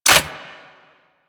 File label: Rifle Mech; Warframe; Weapons; Infested; Inf Quanta